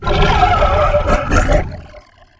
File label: death; npc; cny beast